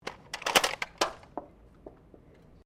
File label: GUN TOY DART LEVER ACTION UNLOADING; Toy Guns (Sound Effects:Toys)